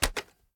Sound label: reload end, weapons; gmod; viper